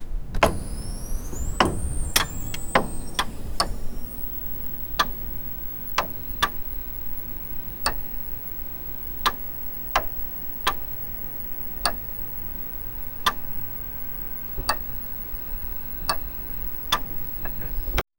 clock ticking sfx starts

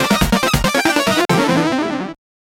battle win strategy, victory

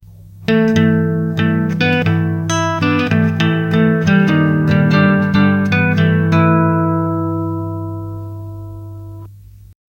guitar playing love song

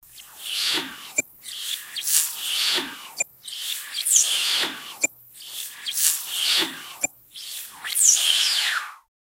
wiping sound, brush

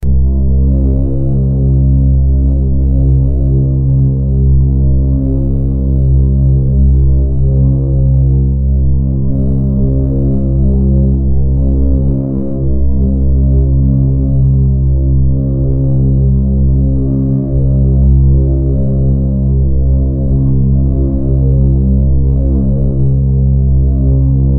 eerie low key sound with, dark

